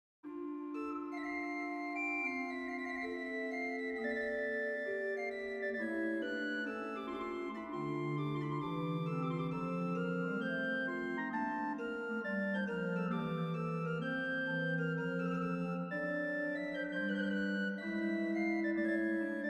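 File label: Recit, organ; 1627; Marchand; Forcalquier; Deschamps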